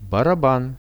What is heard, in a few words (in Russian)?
baraban, Musical instruments; барабан; Russian; RUSSIAN VISUAL DICTIONARY